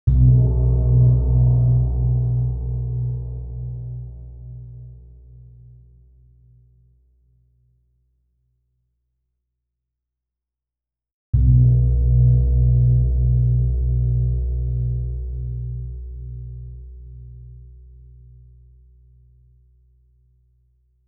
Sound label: Gamelan; Propeller Island - Complete Gamelan